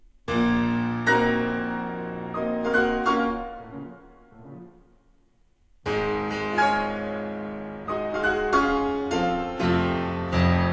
Piano; Nancy Drew: Warnings at Waverly Academy

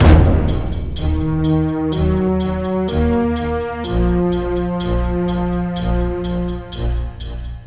Orch; beta unreal

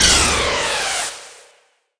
Encount

